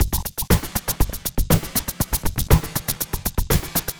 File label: Drama Drums (120BPM); musicradar